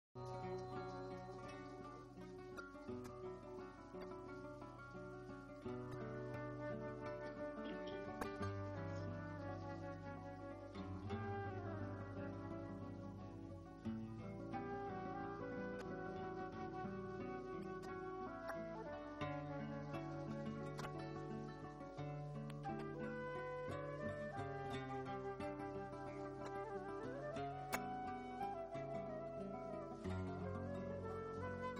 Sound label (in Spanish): Farruca intro, flamenco flute; Oripando